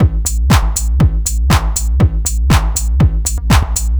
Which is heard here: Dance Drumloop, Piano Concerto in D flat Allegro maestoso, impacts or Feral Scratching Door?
Dance Drumloop